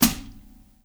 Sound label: hat